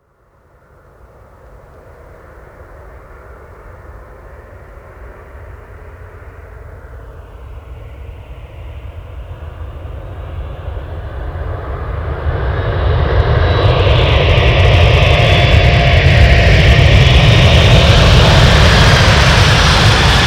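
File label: Landing DC-10; DC-8-62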